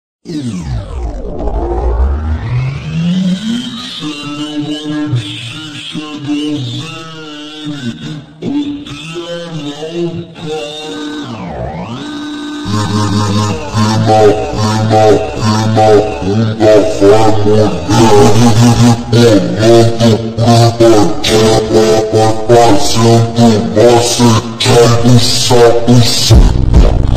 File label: Montagem Slowed